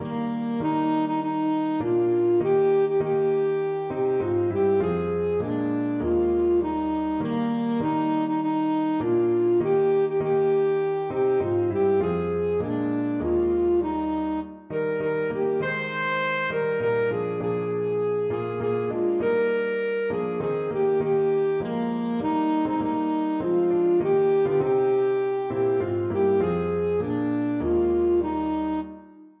saxophone; christmas tree

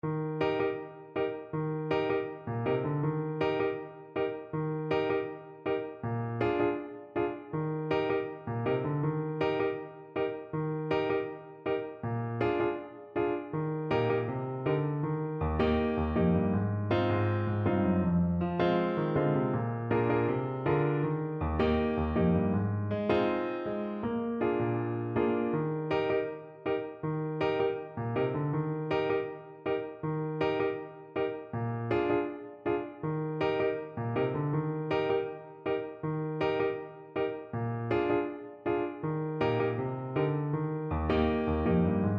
linstead market, French Horn

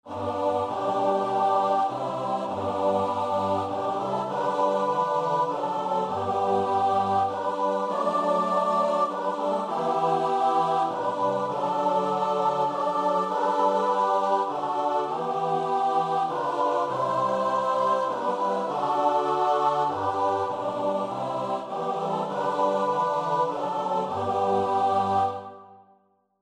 savior bleed, choir

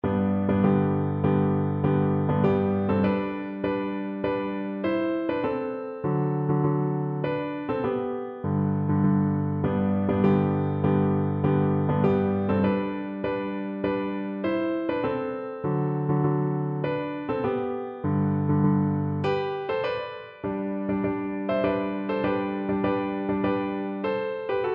send the light piano

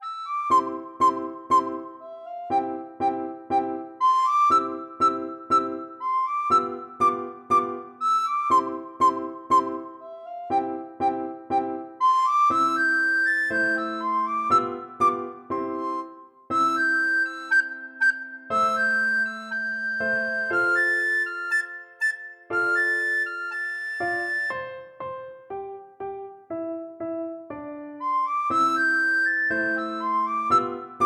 recorder

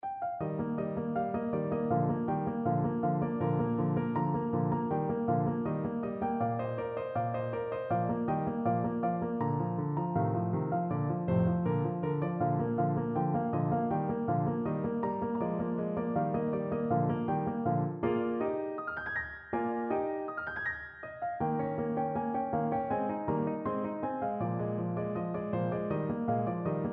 birdcatcher, clarinet

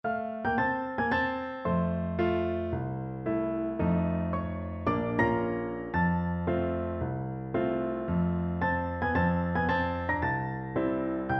piano duet

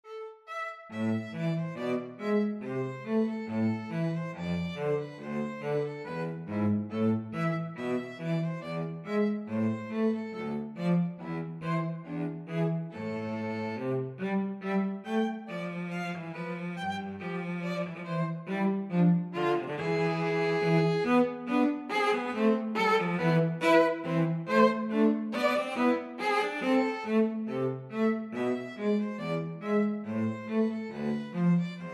violin cello duet

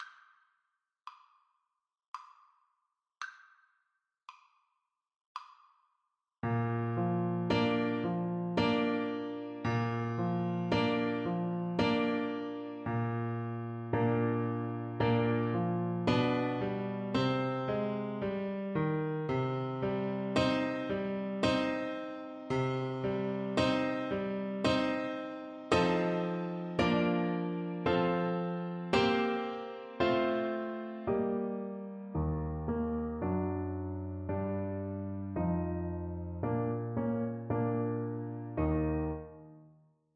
chopin polonaise, flute

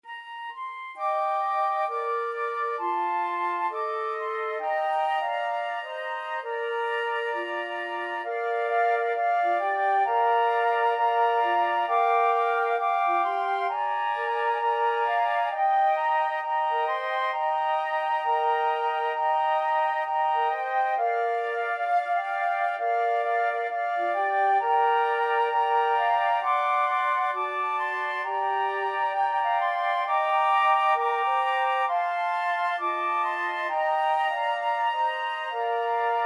this little light, flute quartet

